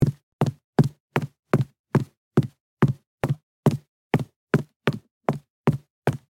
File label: High heels running on carpet